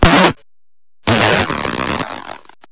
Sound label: farting